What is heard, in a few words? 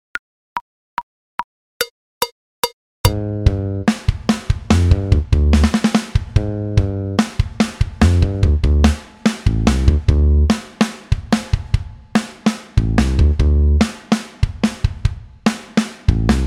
backing tracks